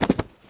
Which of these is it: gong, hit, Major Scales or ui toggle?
hit